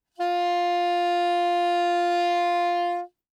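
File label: Soprano Saxophone non vibrato ff F4; Woodwinds; Bb Soprano Saxophone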